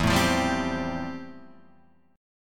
F Major Add 9th E; Fadd9/E strummed; JGuitar

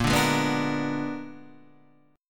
Bb 7th Flat 9th Bb down Guitar Open D

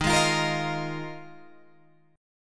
Eb Suspended 2nd Suspended 4th, Guitar, Open G

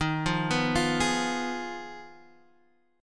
F Minor 7th Flat 5th D sharp

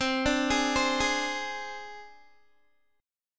C Suspended 2nd Sharp 5th C down Guitar; Csus2#5; arpeggiated

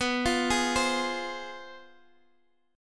C Major 7th Suspended 4th Sharp 5th, guitar

